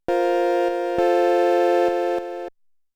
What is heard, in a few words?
Fanfare; rockfish 2011